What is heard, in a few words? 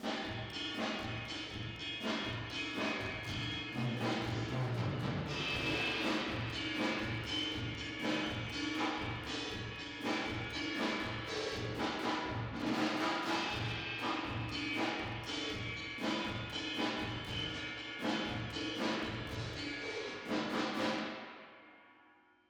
Drums Verb